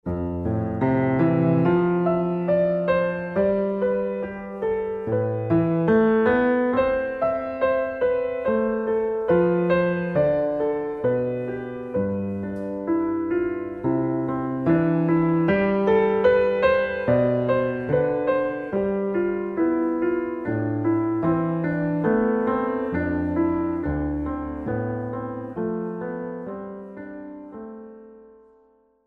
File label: Invention